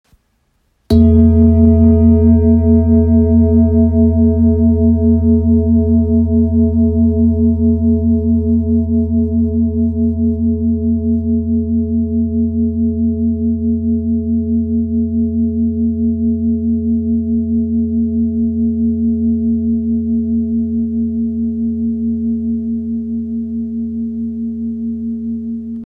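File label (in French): Bol ramgandi mi, bol chantant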